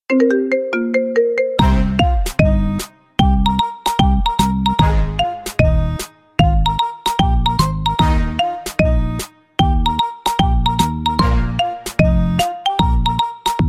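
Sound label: siren beat loop, iPhone Remix, ringtone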